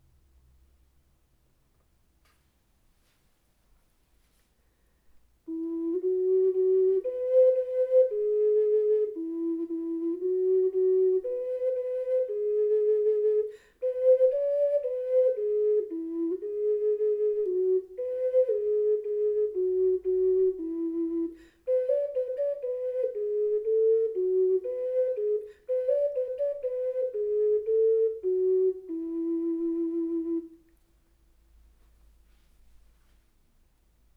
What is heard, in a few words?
Ocarina; Takacs-Ocarina; Die Erde schmückt sich wieder